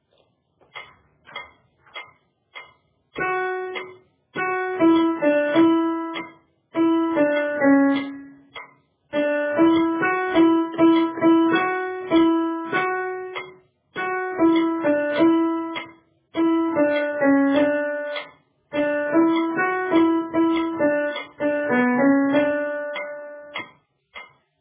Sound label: Alto